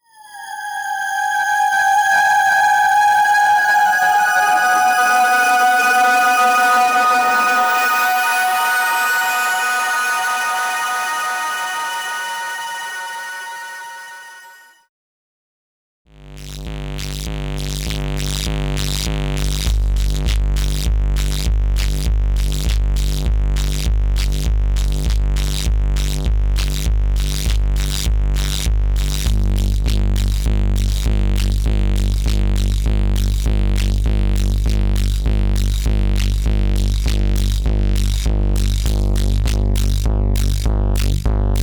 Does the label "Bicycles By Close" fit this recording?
No